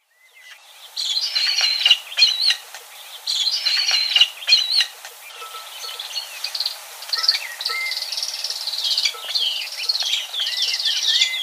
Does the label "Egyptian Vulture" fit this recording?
Yes